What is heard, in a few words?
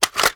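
assault rifle reload empty mag out; viper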